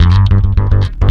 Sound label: ROCK BASS; Big Beat Samples & More